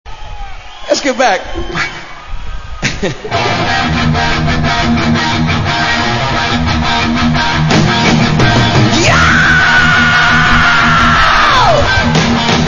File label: Scream